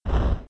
fire start

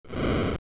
Candle; zelda

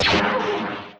gun turbo

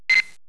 beep

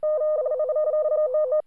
Sickbay Telemetry; trek

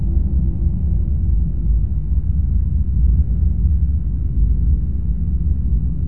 Contain Amb; trek

